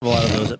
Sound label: snorting sound